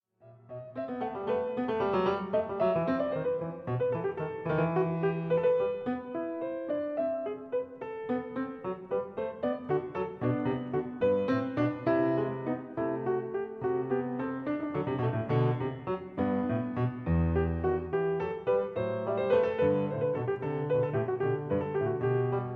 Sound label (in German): Variation 24 Canone All Ottava fuer 1 Clav